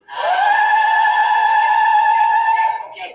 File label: choir makes random notes; sister act